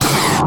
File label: Static; Blastwave